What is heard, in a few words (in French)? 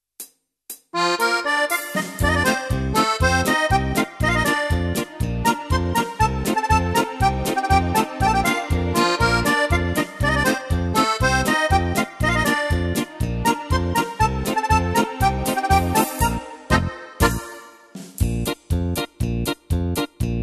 marche